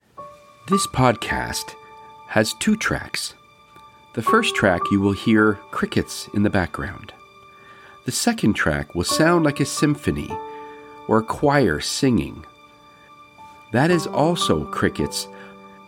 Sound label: aura shedding meditation with crickets and tuning forks